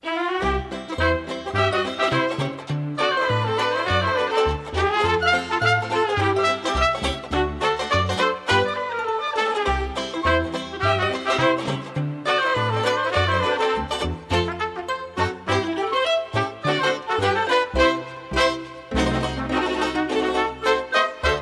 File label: soundtrack